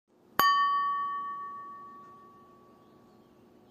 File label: crystal, notifications